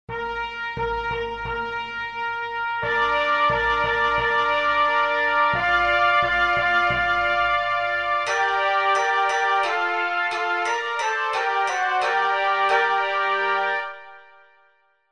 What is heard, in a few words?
Fanfare